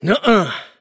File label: deny pain; davion; dota